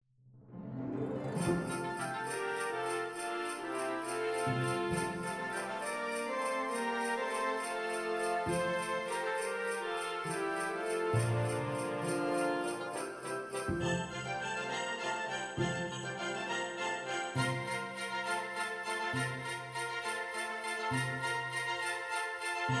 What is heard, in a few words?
grand orchetral christmas